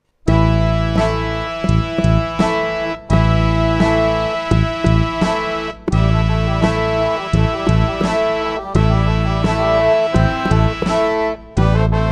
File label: Rock Café